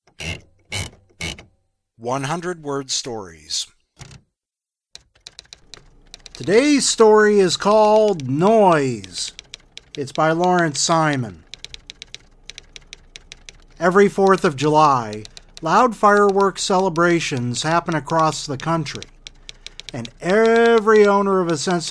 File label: noise